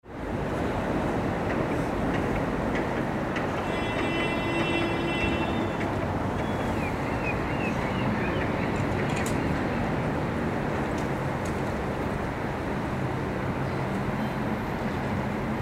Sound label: city ambiance